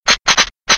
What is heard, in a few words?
dryfire; Defective Turret; sp sabotage factory defect